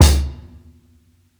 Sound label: KICK AND HH, drums; Basement drums vol 2